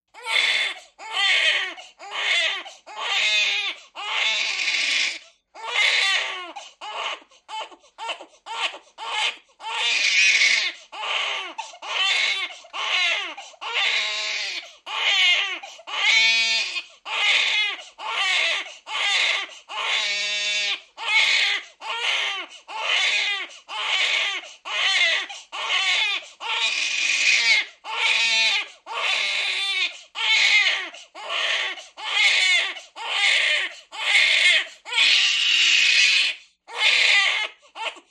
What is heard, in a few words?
BABY SIX WEEK OLD GIRL: INT: Crying hys; BABY SIX WEEK OLD GIRL: INT: Crying hysterically.